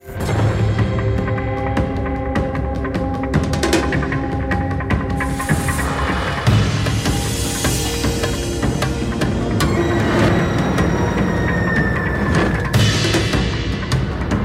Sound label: SPHERES DRUMS